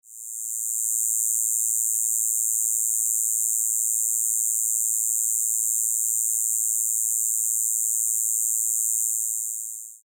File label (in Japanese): kubikirigisu mono